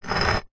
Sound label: rock or stone movement slight on concrete ground; foley